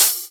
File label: Open Hihats, Cymbals